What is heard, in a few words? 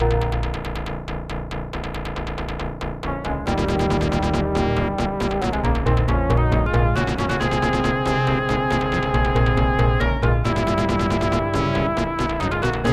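background music; Strider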